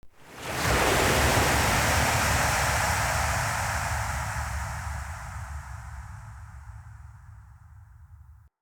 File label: Eternium Wave Crash; Ambience; Eternium Garden